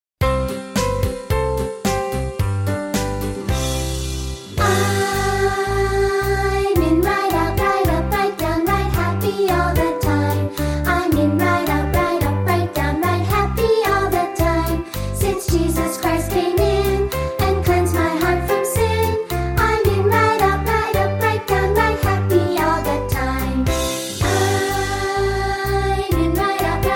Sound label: Childrens Choir